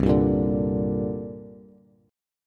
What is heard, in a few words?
C 7th Suspended 2nd down Bass; C7sus2 strummed; JGuitar